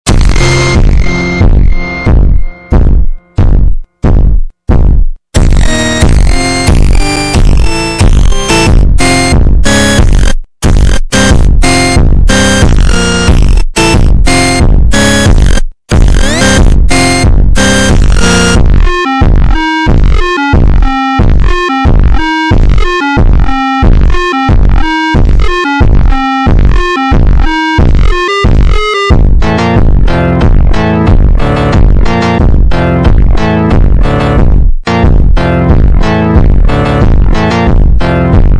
funk mix super slowed reverb

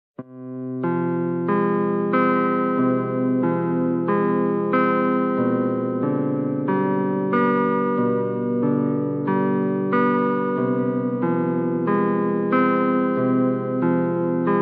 super slowed reverb

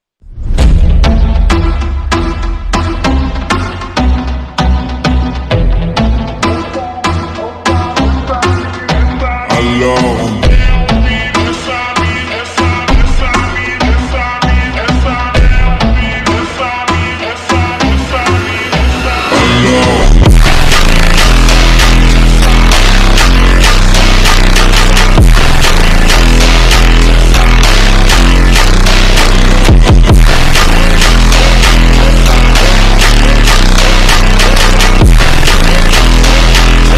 brazil phonk slowed reverb

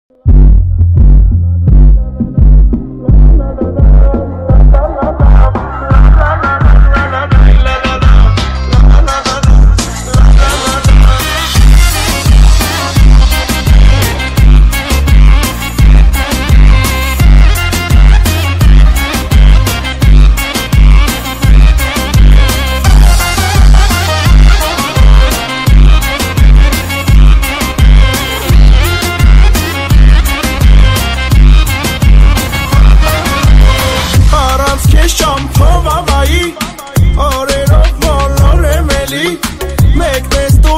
Armenia bass muzic; by bass exegnadzor

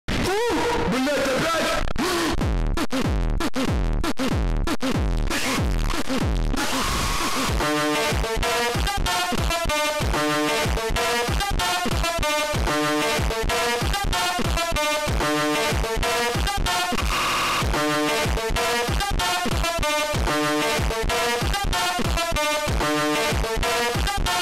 funk slowed; squid game

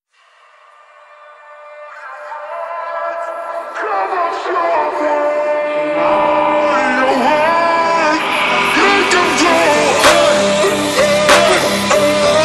slowed

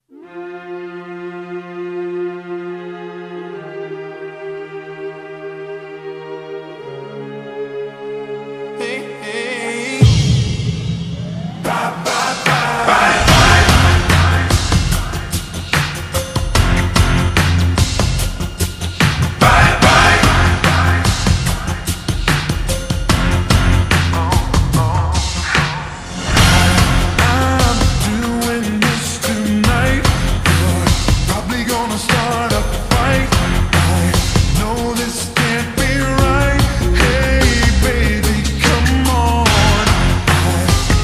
slowed reverb

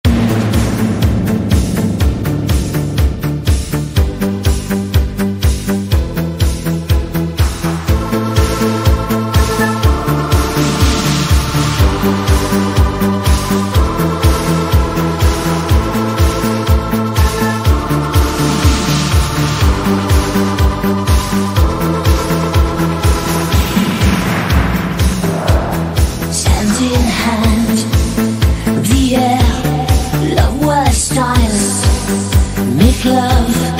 AI song, italo disco

